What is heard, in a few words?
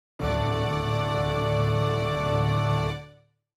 Perfect Percussion Success; WarioWare: Get It Together! (Switch) (gamerip) (2021)